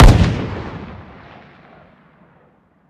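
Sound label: weapon lmg fire player atmo ext; gmod; viper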